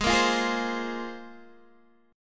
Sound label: Bb 7th Suspended 2nd Ab